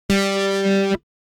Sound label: Electric guitar heavy G string single pluck